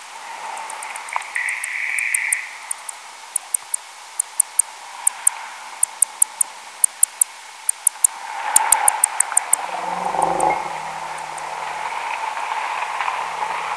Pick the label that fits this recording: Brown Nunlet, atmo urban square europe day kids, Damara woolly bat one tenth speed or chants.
Damara woolly bat one tenth speed